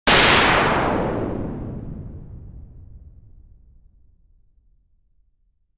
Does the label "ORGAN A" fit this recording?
No